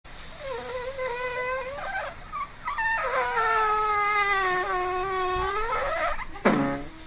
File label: waffle poots